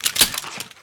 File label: Gun Draw Select Weapon, Weapons Foley; SFX Kit